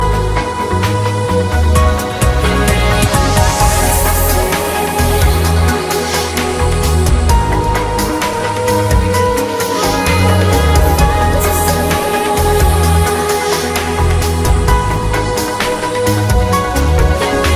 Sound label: chillout ringtone